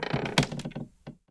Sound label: wood lite creak; blueorange